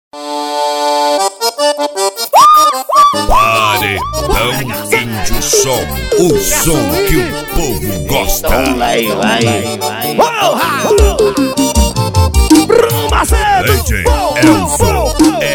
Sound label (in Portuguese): forró sampleado; cd paredao indio som vol 04